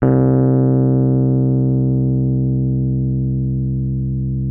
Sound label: MKFF C2, Rhodes; RHODES A; Xsample VOL-1